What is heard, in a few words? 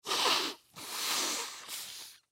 Human Blow Nose Wet Squeak; Sick; Blastwave; Humans